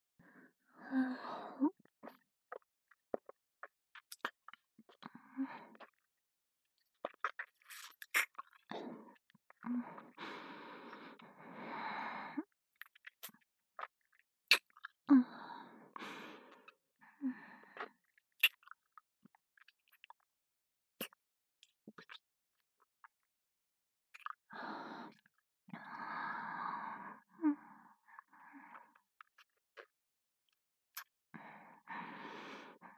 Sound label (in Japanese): キス, ASMR; アドリブ集